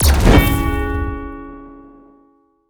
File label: bonus Scatter; netent